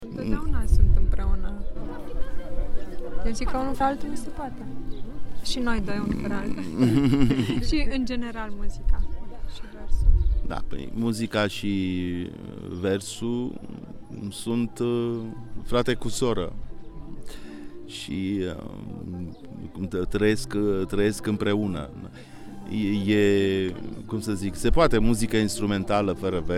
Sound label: duet